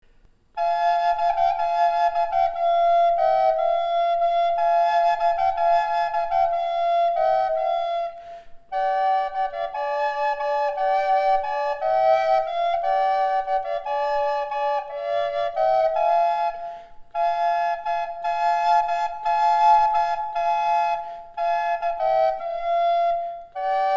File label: flute double linden